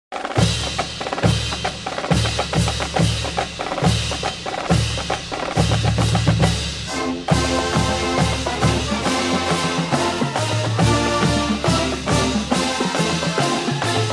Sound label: Fight Song; GHS